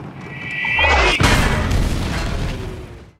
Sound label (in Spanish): impacto artilleria